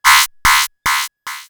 Alert, Retro Games Sound FX

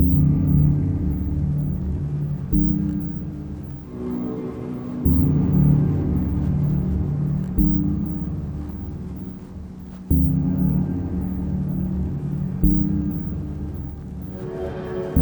Lofi Music End Loop; Kawaii Love Lofi Music Pack 2; Music Stingers and Jingles

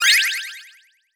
Win

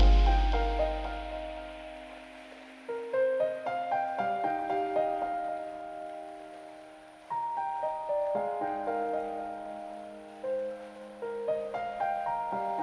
Kawaii Love Lofi, Seeking End Stinger; Kawaii Love Lofi Music Pack 2

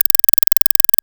Machine, Retro Games Sound FX

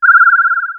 Uniscanner, Retro Games Sound FX

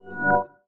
Complete, UI

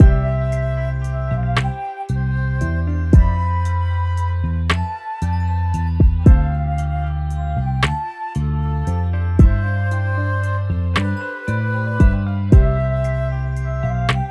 The Journey Loop, Lofi Music; Kawaii Love Lofi Music Pack 2

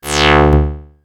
Robot, Retro Games Sound FX

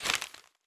page; Fantasy Interface Sounds

